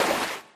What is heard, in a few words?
se waterhit